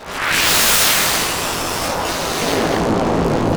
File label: Turbosweep, Analog, LFO FX; Industrial